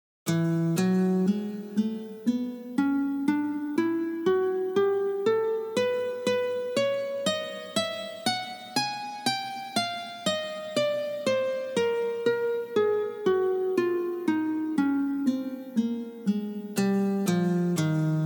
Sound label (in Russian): Uprazhneniya dlya rastyazhki paltsev